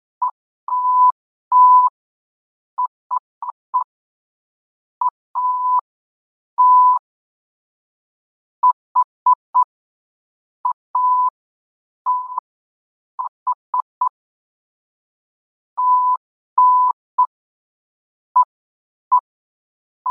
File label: what hath god wrought 1000Hz